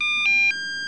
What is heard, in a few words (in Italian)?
squillo